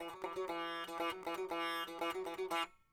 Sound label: sitar